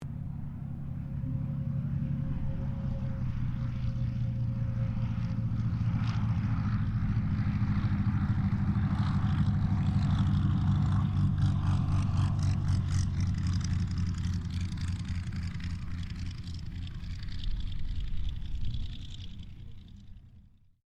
Aircraft P-40 Kittyhawk land, WW2 Kittyhawk or Warhawk fighter plane; 1940s; Airplanes; Vehicles